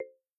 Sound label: paytable Button Click; mythic maiden